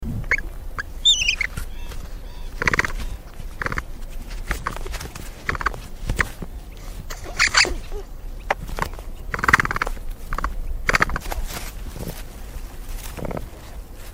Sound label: Capybara